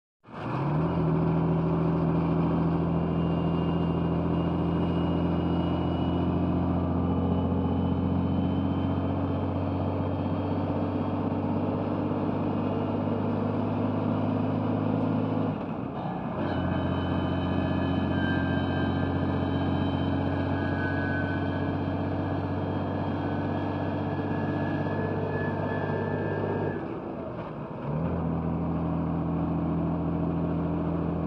Truck Interior Stead

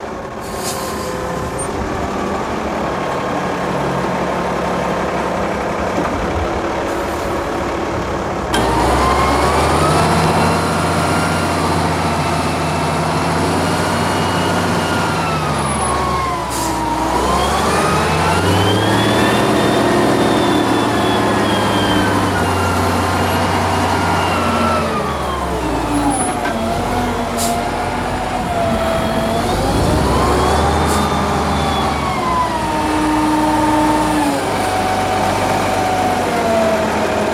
Garbage Truck Front

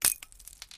Flash Bulb Pops Poofs

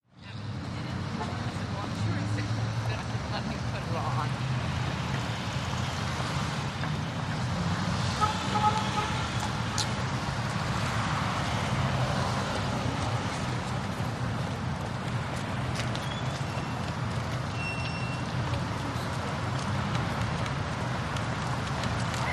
NYC BG Fifth Ave, big city

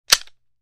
Bolt Cutters One Sni

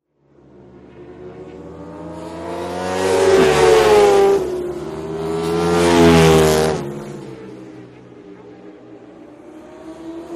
Motorcycle Road Racing

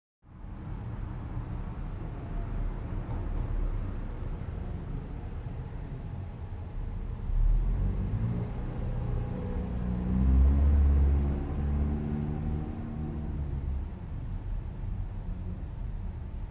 Night Urban Trucks, ext various

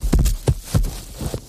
Horse Hoof Hvy Dirt

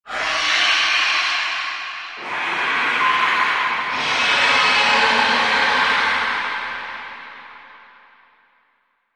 Monkey Scream Eerie, vocal elements

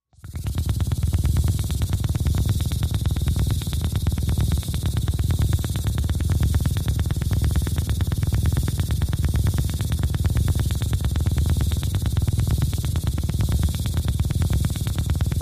Pulse Percolator Mach, electrical

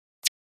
Flash Gear Short HF El, flash gear

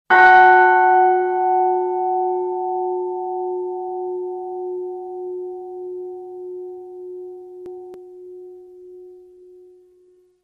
Church Bell Single, bell towers

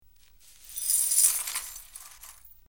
Light Sweeping Up Gla, cleaning, sweeping

